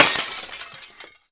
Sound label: impact glass window smash; sound effects one